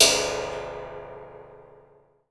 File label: RECO RECO, Percussion; Voice Crystal - Steve Reid Definitive Percussion; BRAZIL SET